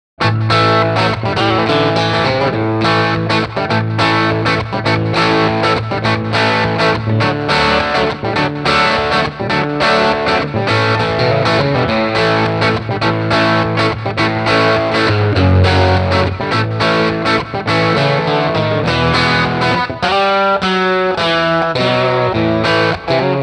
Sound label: Country Blues Guitar; sessionguitar; Session Guitarist